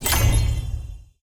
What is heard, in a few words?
ui award